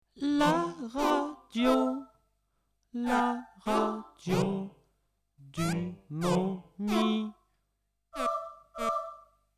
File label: jingle